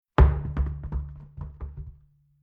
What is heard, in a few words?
Mechanical Large Tire Dropped On Ground Dull Thud Bounces Rolls Around; Low Impact